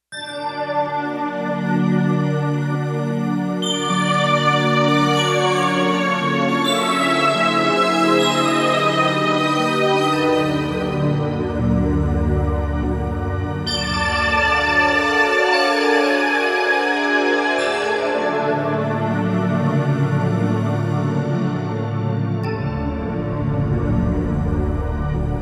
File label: Space landing, synth